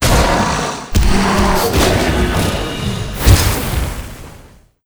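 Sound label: king lion death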